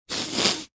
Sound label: Sniffle, Female sniffle, two sniffs, short, (human voice); Sniffling; Sound Effects:Human; Voice Box